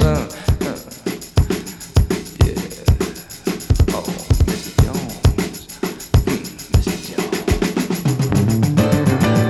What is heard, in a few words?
101 bpm fresh drum groove d key, Tuned drums (D key)